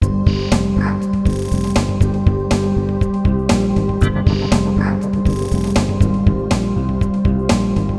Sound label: loop